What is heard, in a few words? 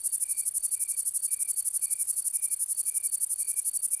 song, katydids; neduba; carinata